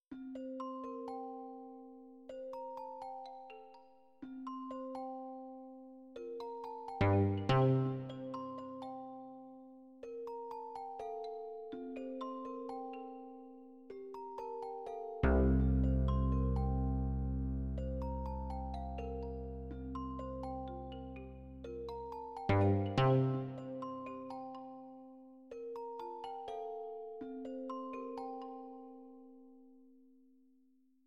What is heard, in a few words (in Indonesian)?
Nada Dering Nokia Jadul; Brook